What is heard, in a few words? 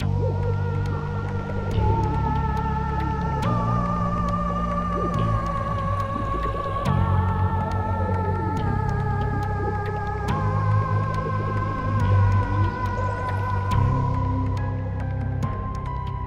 Swamp